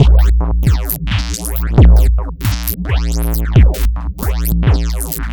Drums Strange

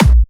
Trance Kicks; Bassdrums; Vengeance - Essential Clubsounds Vol.5

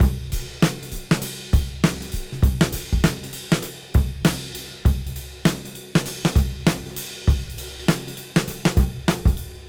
Cym Beat 99 BPM

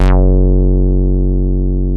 SQUARE, BASSES; Masterbits; Vintage Voltage

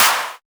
Claps; Vengeance - Essential Clubsounds Vol.5

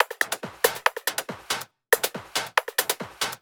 Loops 140 BPM Hihat Percussion Loops

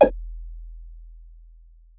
Hats, Alesis Performance-Pad

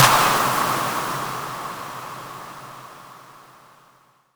Impacts, Effects

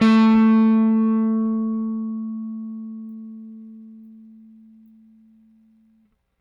Rick, Rickenbacker Bass; Airwindows

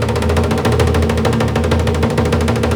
A#3, JAPANI PERC; Northstar; The Wizard VOL-1

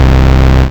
BAS, Eurotech; Sounds Good

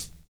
VINYL HH, HH CLSD, Drums Dance & Groove

